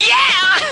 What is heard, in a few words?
"yeah" sung